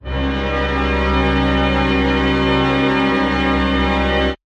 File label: Jazz Strings